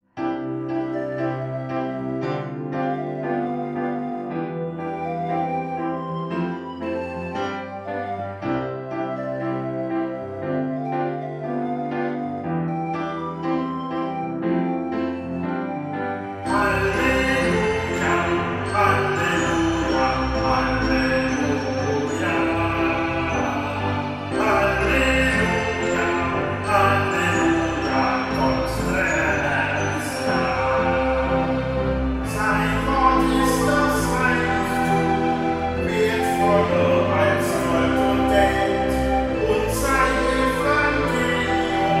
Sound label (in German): Kindermesse Halleluja Gesang